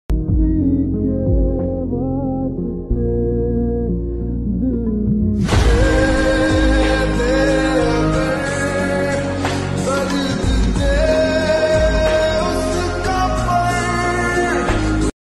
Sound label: alone sad lofi ringtone